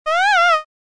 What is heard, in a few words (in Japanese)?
モンスターの鳴き声